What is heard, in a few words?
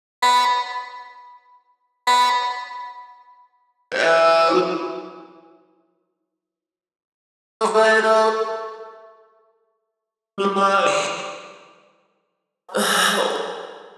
130bpm Tearout Intro Vox Melodic Loops